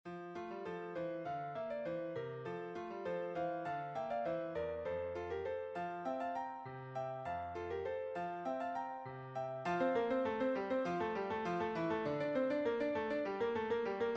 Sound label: Sicut Locutus est bass